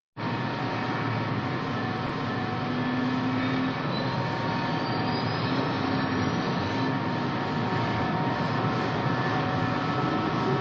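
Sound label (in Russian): перед стартом в самолете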